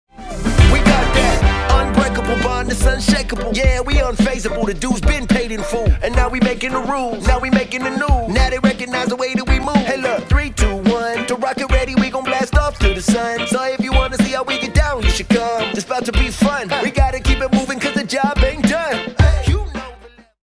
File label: RAP